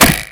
flechette impact stick

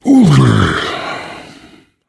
pain relief sigh, survivor voice; source engine; left 4 dead 2

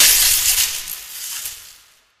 spark, coop electric

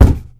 cardboard box impact hard, physics; Source Engine; video game

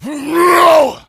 become enraged, infected

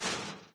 chainlink, footsteps, run; player; source engine; left 4 dead 2; boomer

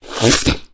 smoker reel tongue in, miss

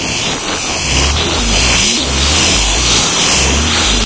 teleport malfunctioning; ambient; source engine; hl2; labs; video games

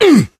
melee swing, player survivor voice

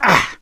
melee swing, gambler, survivor voice; source engine; left 4 dead 2

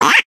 bumper car quack; ambient; Source Engine; tf

